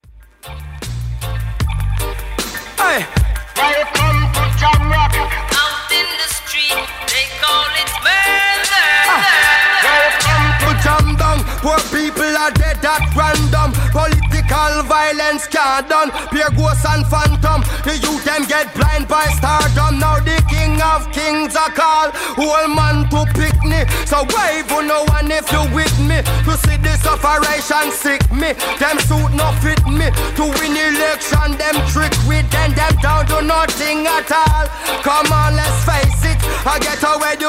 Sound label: reggae